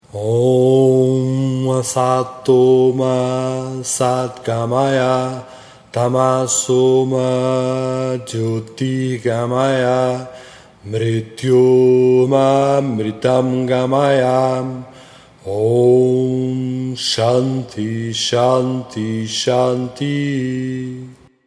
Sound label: OM ASATO MA SAD